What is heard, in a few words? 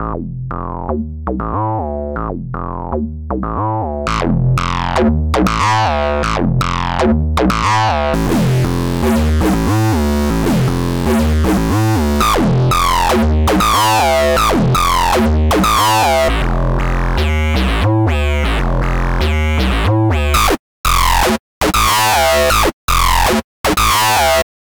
Acid Bassline; Corrosion